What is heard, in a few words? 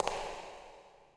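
echo walk, footsteps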